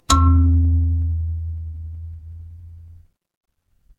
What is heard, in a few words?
instrument kalimba